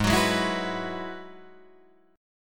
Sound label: G sharp Minor 7th Sharp 5th G sharp down Guitar Drop D